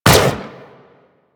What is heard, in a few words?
Sentient Laser Fire; Warframe; Sentients; Symbilyst; Weapons